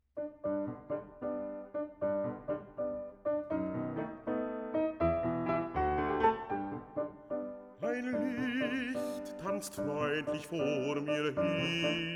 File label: Winterreise, song cycle for voice & piano; Book II, Tauschung; Schubert